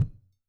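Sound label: dundun; ILIO - Ethno Techno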